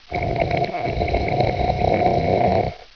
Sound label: GURGLE; homm2